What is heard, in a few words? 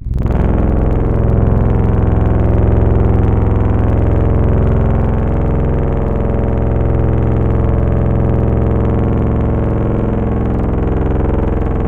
Big Brass; Instruments; Synthetic's Serum Collection; Echo Sound Works Core Tables